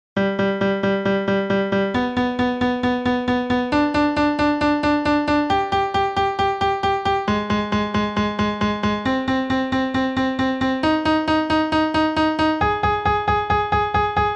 ネイ音源 ピアノ 女性低音

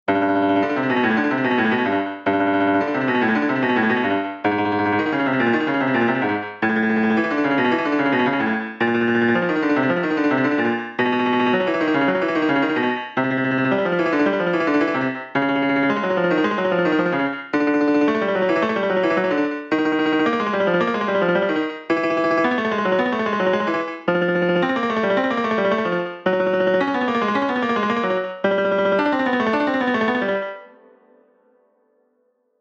タングトリル用 ピアノ 男性低音

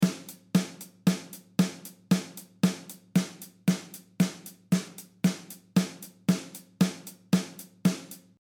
トレーニング用・表拍

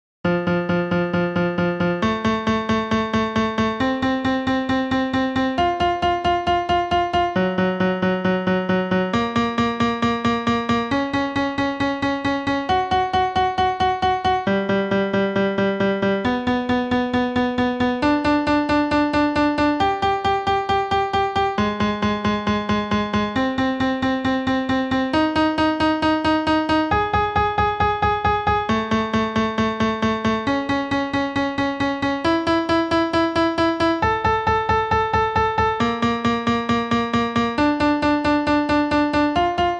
ネイ音源 ピアノ 男性高音

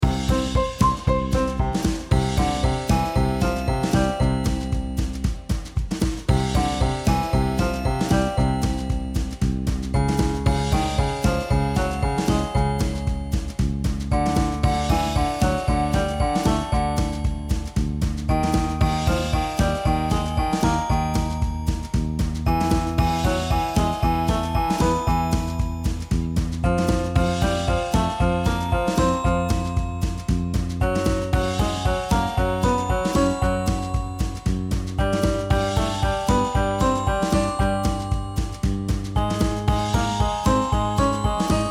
グッグトレーニング用 男性中音

